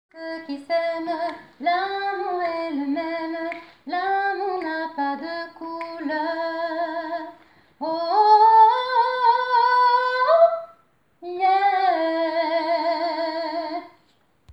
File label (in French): Soprane; La chapelle